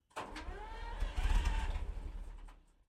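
stug 40 onboard start try fail false start exhaust left; Pole Position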